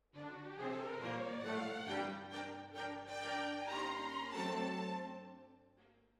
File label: Hall, Jazz for piano